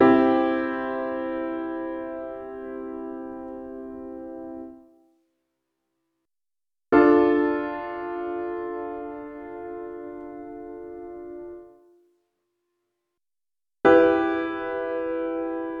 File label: basic degrees in major